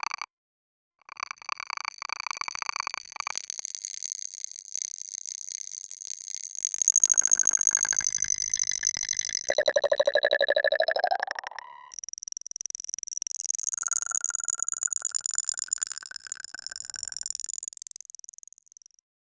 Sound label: the sound with which magi, mushrooms